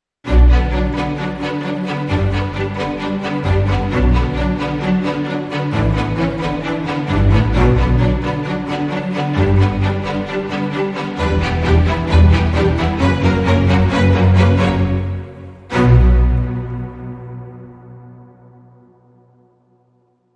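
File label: Hybrid Sting, Orchestra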